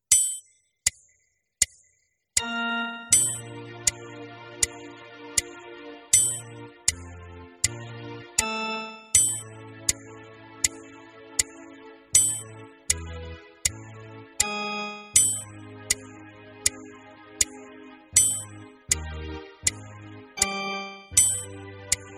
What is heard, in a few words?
warm up exercises